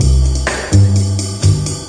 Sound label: 127 nana groove, sampled music loops